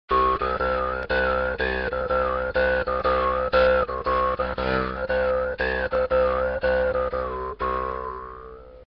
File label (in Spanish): birimbao; tradiberia